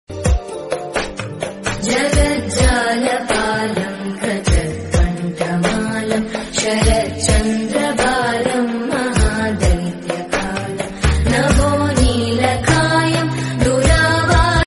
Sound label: telugu god bhakti songs; jai hanuman